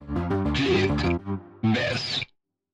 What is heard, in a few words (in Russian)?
сбивка; audiobrending dlya podcasta